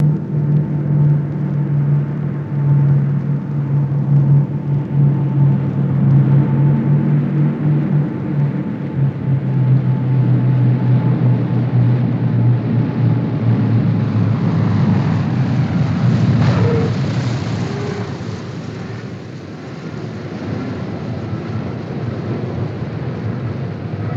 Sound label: B-29 Land Good In, Long Out, Offset At Hit